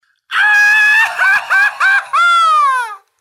Mexicano

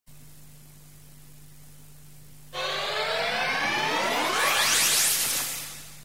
Missile

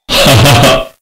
Laugh EARRAPE

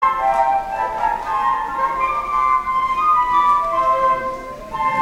Flute Choir

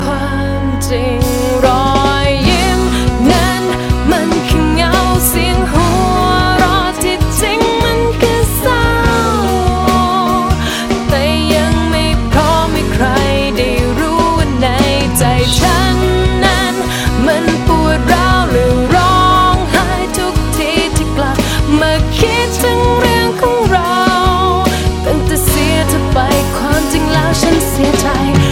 Thai Song

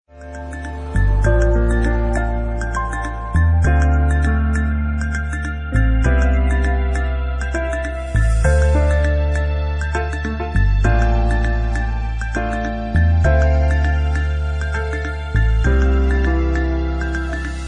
Relax Tune

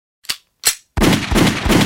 Gunshots

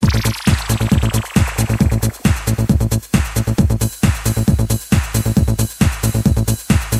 Techno Wave

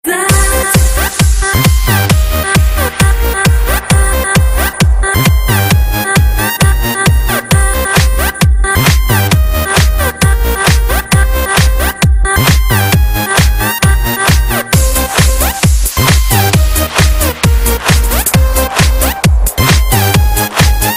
Electro

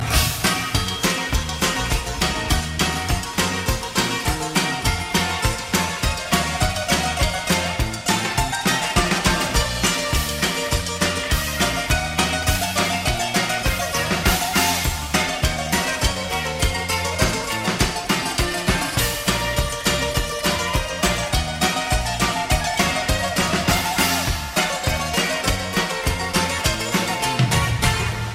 Romantic Guitar

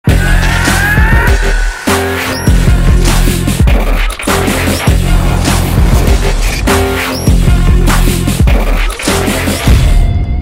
bgm; Mass

